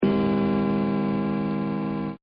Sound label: Grandfather Clock Chime; Clocks; Machines